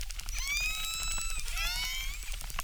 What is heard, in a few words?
Chirp zap, acquarium